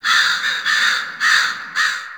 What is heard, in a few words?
Fall Crows